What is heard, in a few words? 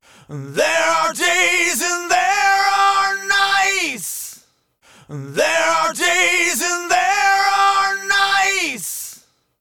vocals; softube summit audio grand channel